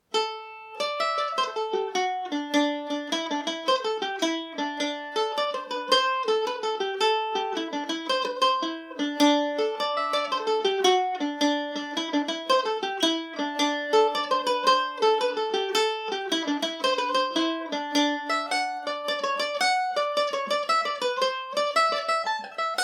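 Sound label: D mixolydian, Irish mandolin; pipe on the hob revisited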